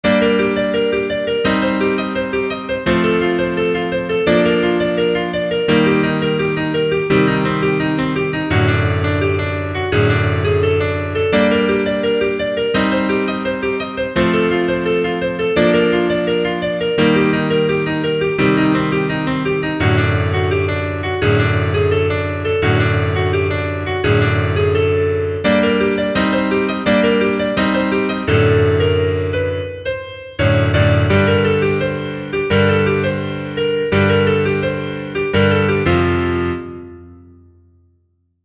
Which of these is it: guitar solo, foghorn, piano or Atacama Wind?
guitar solo